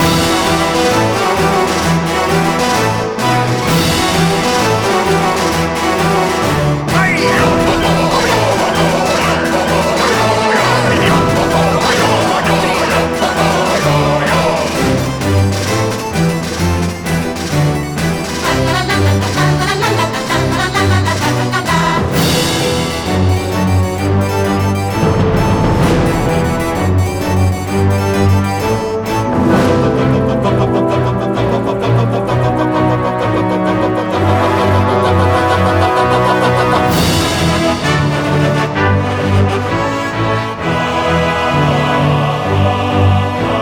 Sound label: Music - Race Loop